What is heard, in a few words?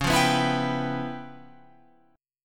C sharp 7th Sharp 9th Flat 5th C sharp Guitar Open F; strummed; C#7#9b5; 13,12,11,11,14,14; JGuitar